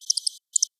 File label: echolocation audible